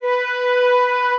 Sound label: flute